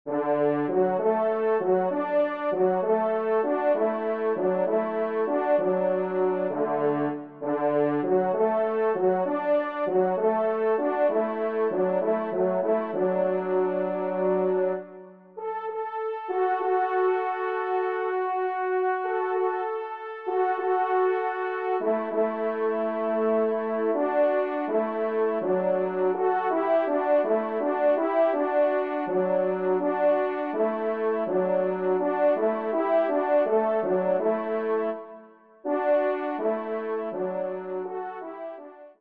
Variation trois Trompes

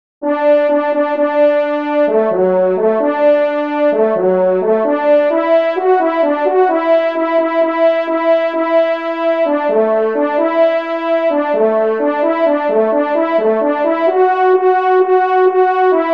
marche, trompe; messe d'estival

